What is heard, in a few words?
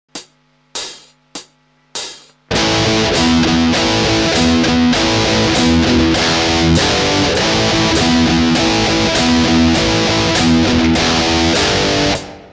n harm, natural harmonics